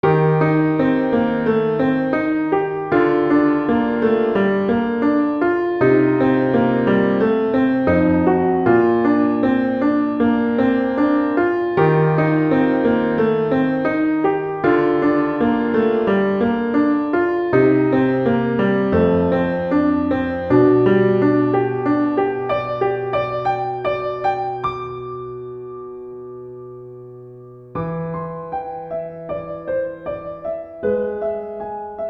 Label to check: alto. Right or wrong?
Wrong